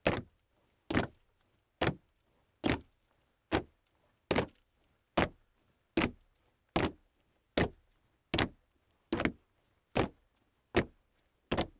Human Crowd Stomping Feet

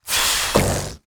Cartoon Balloon Releasing Air Quickly

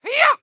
Human Male Karate Yell Hey-Yah

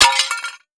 Crash Tile Drop And Break; crashes; AdobeCC

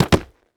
Foley Footstep Baseball Cleat Dirt Debris Jump; Foley Footsteps

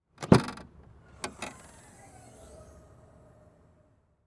Transportation Jeep Commander Open Trunk

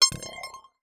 Beep Evolver; Adobe; Multimedia Internet CD-Rom Flash

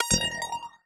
Multimedia Internet CD-Rom Flash Beep Multiple

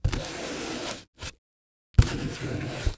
Industry Tool Tape Smooth On Box

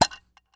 Case Open Plastic Metal Rattle; Foley

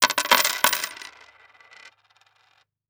Impact Coins Dropping On Wood Table; Impacts

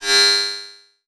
Multimedia Internet CD-Rom Flash Beep Low Pitch

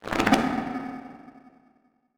Title Transition Digital Hit; Production Element Title Transition

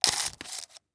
Industry Tape Measure Wind Up

